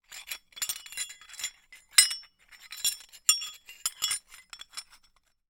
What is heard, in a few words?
Hole Saw Rattle, Hole Saw Bits